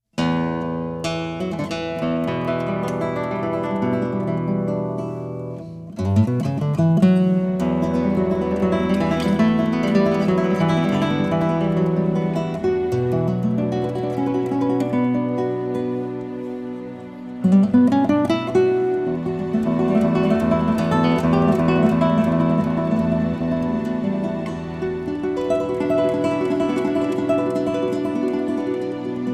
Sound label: guitar